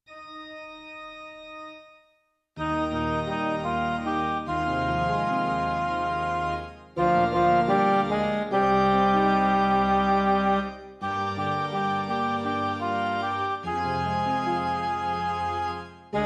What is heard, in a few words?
midi